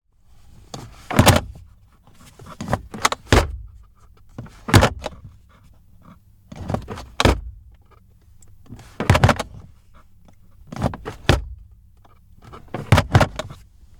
Mazda Miata MX5 NBFL 1.6 Stock - Foley - INT - gloves compartment; Soundholder